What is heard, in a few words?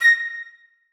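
flutes staccato a5; Sonatina Symphonic Orchestra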